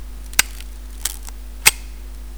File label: GSN cocking, Yashica Electro GSN; Yashica Electro GSN makes